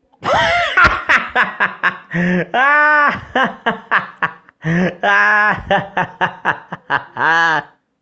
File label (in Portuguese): risadas